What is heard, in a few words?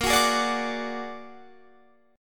A sharp Augmented 7th; x,x,20,23,20,21,20,23